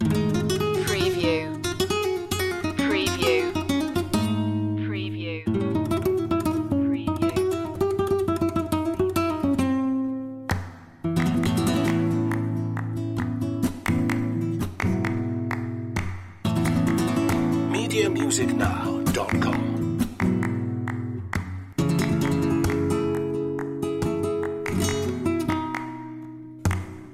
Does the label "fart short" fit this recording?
No